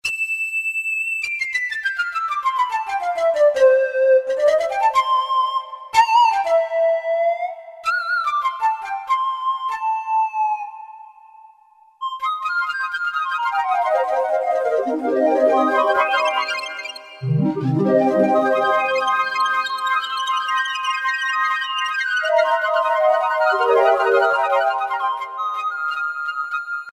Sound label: Pipe Solo, Roland D-50; Factory preset demos